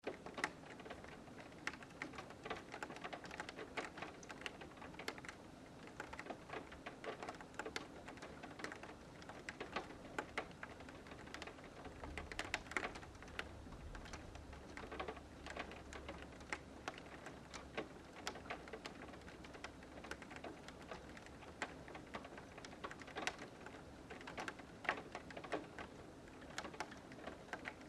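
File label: Rain interior Window Pitter Patter Light; Weather; Big Room Sound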